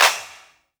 clap, hip; Best Service; Dance Mega Drums

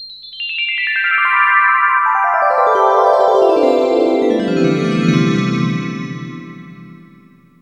chimes down, synth chimes; Zero-G Ambient 1

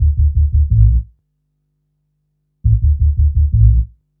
Hum Bass; Drum & Bass; Fluid Dynamics

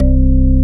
ORGAN; Best Service; Dance Mega Synth; 90 s Sample CDs

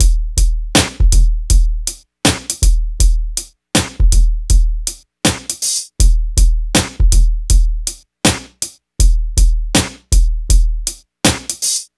Hip Hop and R&B Drumloops; Best Service; ProSamples vol.01; AKAI

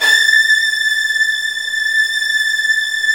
Virtuoso Strings

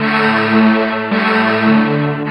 Insulator Horns, Woodwind

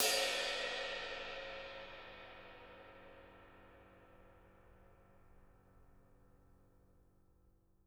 rides

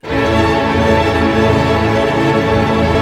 STR ARP MA, Symphony Orchestra, STR Arpeggio; Roland LCDP08 Symphony Orchestra; STR Arpeggios

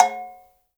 AFRO AGOGO; Spectrasonics; Heart of Africa

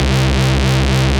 C Bass, DnB One Shots

